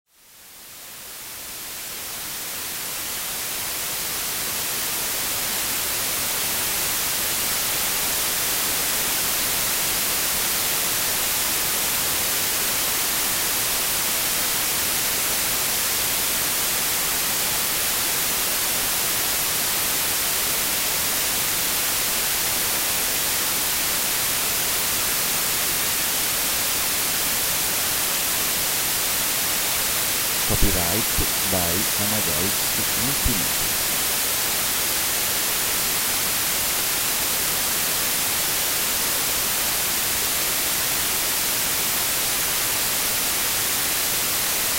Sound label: gaussian noise rumore gaussiano